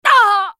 dying; Kennen; Character Voices - English

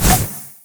fracture consume; LoL; skarner

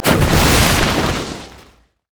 inferno bomb; LoL; ziggs snowday

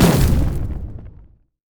shield effect; LoL; malphite

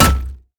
basic attacks; Dr. Mundo; TPA Mundo

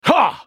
effort; JarvanIV

